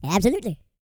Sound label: E TALK, Vocal Planet R&B & Dance; ILIO; Vocal Planet VOL-4